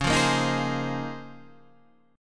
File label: A sharp Minor 11th